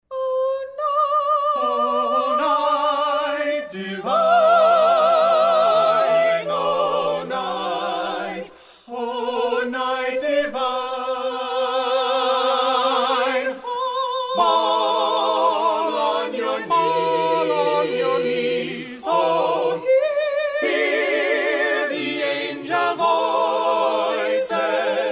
Carolers; St Louis; Oh Holy Night

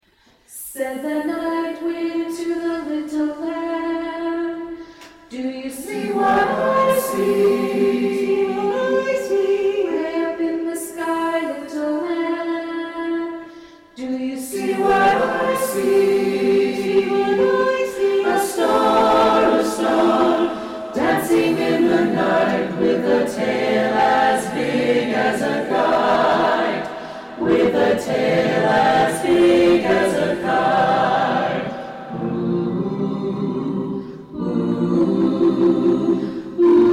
Carolers; Sacramento; Sacramento Carolers 1

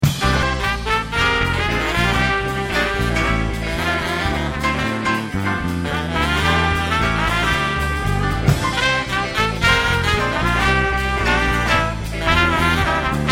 Jazz Band

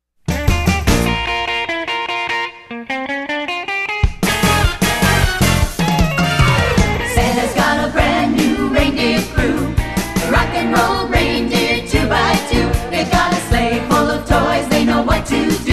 50s 60s Band; Los Angeles 50s and 60s Band

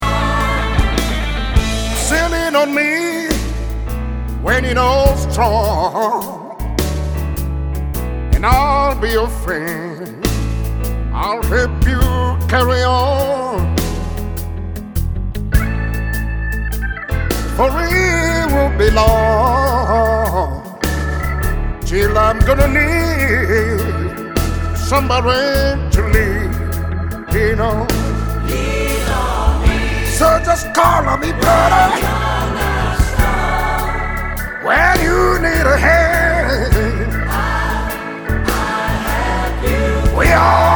Soul Singer; Frankfurt

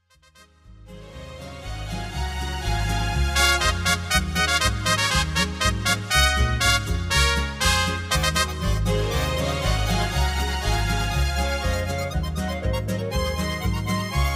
Spanish Singer

